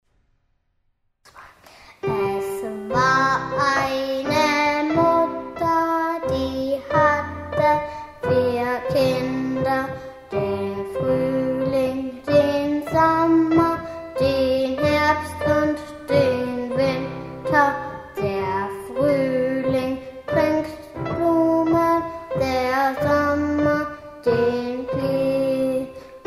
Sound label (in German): Gesang Kavier, Es War Eine Mutter